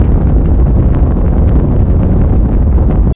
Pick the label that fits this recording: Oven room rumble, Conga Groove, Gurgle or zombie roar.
Oven room rumble